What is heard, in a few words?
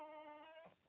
crying, baby cry